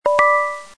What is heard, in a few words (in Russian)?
звук сообщения из майл агента; mail agent message